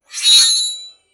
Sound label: crisp katana unsheathing